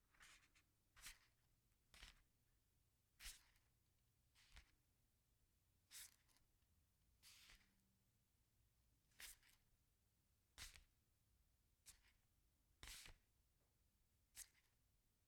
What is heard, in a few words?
feeling paper